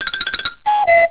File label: cuckoo